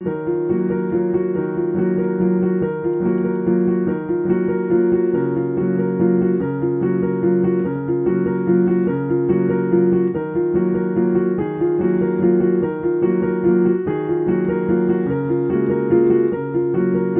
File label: Piano; ゆめ2っき